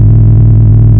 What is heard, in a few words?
Shield; geo-cub